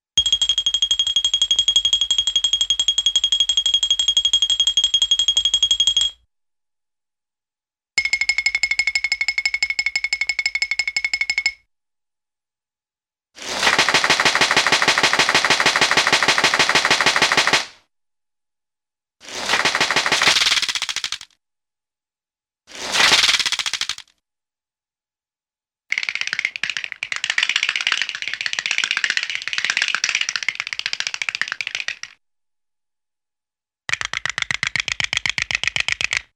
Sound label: Funny Rapid Metallic Clinks - Window Shade Roll Up, cartoon; Hollywood Edge; Cartoon Trax